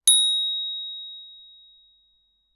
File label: ding, notification sounds; zulip